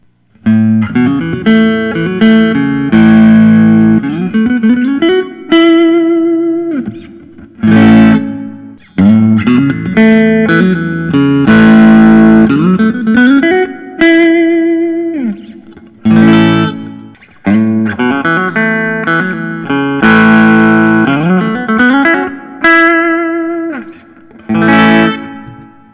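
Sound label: fender custom shop p 90 telecaster; john cruz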